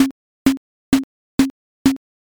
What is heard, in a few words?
mokugyo